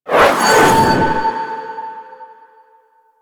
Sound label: Glass Fragmented Cast; DE; Warframe